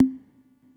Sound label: bop; netent